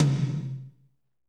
tom, Kit Wet; Northstar - Drumscapes Roland

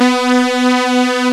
PPG BRASS B3; East West - Global Sounds; PPGWAVE22

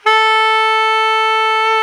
soprano sax A 69; Northstar - New Gold VOL-1

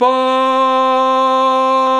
VOX, Male Scats; 90s Sample CDs; Club-50 - Foundations Roland

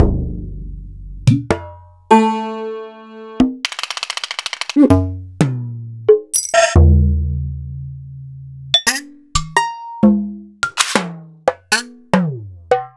EMU Planet Earth

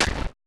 kick, Roland MC-909

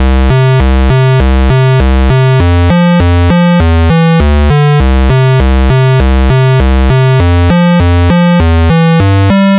NES Bass, chiptune; Sonic Foundry (Sony Creative Software) - 8-Bit Weapon A Chiptune Odyssey; Nintendo Entertainment System

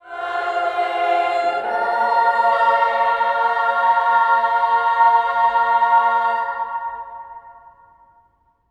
ALLELUJ, ALLELUJAH, Symphony of Voices; Spectrasonics

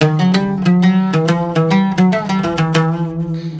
World Lick

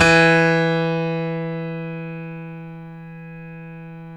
StereoGrand, Keyboards

